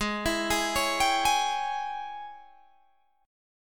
G sharp Major 7th Suspended 4th Sharp 5th, Guitar, Open G